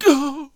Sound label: Healer Death Vocals